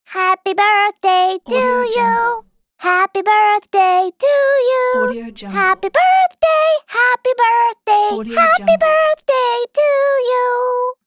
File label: child singing happy birthday